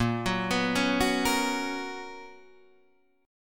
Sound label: B Major 7th Suspended 2nd A sharp, chord shape